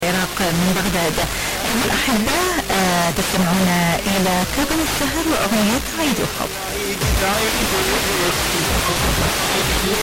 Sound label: 89 1 MHz Radio